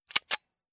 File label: prime CLKCLK